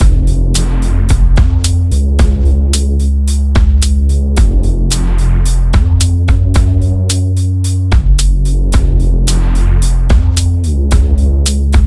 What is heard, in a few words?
Tense; Everspace 2